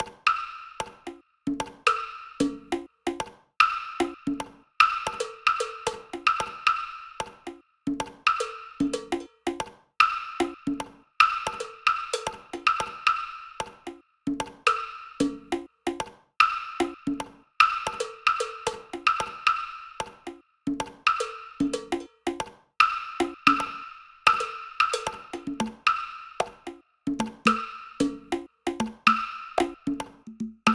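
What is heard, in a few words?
percussion; Toki Tori Collection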